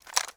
ui drop gun; Rust

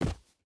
footstep hide dirt jump land; Rust

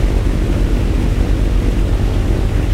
b25 cockpit, engines; War Thunder

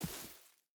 footstep rubber boots grass walk; Rust

